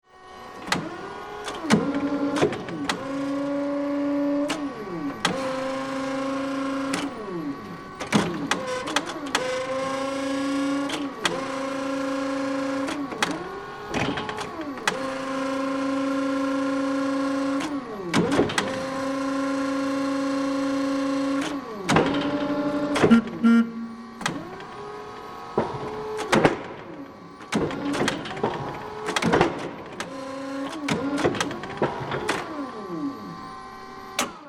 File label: Industry Hi Lo Activity Busy, factory; Factories; Backgrounds